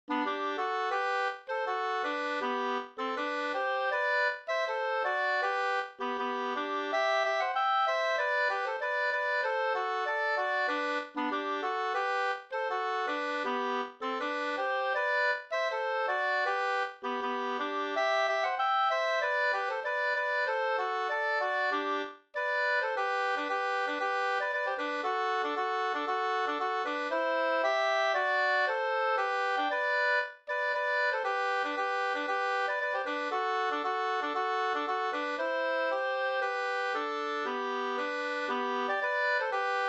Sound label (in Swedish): folkdans, nl; De vleegert 2st